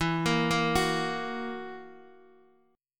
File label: E Suspended 2nd Flat 5th E down Guitar Open D